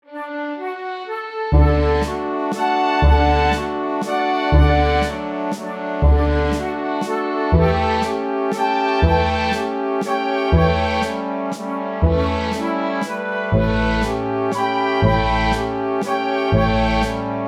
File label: cello